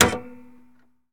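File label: Truck Cherokee Hood Latch; SFX Kit; Trucks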